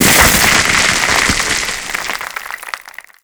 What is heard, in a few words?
ceiling rock fall break; Call of Duty Modern Warfare